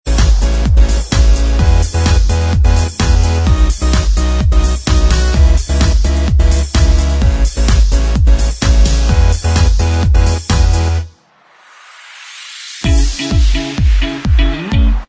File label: Inst